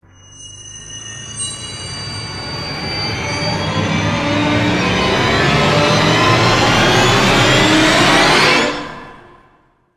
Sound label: Rise; Ninja Tracks